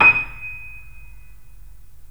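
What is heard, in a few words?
piano, D#, Yamaha; InVision Interactive; The Piano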